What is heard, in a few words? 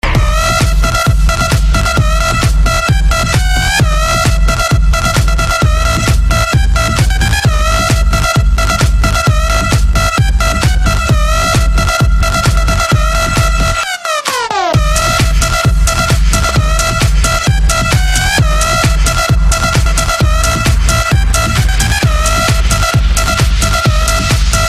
Electro